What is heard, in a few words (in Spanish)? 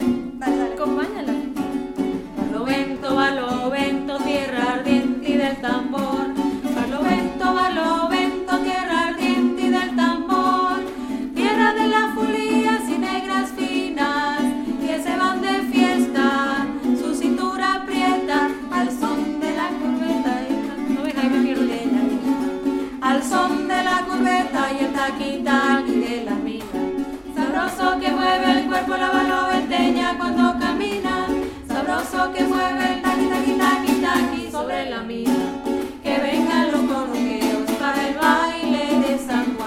barlovento contraltos